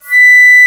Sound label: Flute mf; Digital Sound Factory Virtuoso